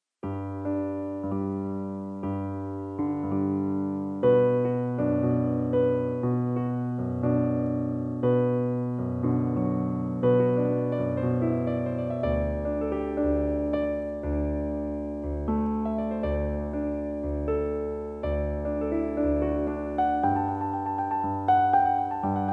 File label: Piano